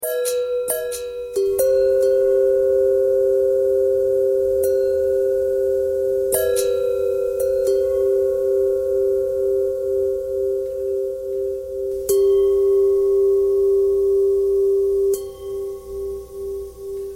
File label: bell longbell thread bendthread; bells